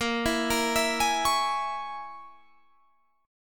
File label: Bb Minor 7th Flat 5th down Guitar Standard; Bbm7b5; arpeggiated; JGuitar